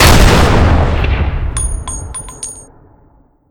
Barret M82; weapon

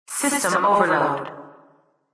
system overloaded, interior keypad

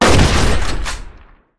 Breacher Shotgun; weapon

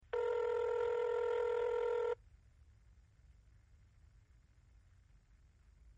dialing tone

